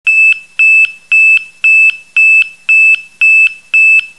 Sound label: High Pitched Beep, alarms